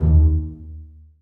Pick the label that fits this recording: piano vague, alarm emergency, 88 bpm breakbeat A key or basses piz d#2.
basses piz d#2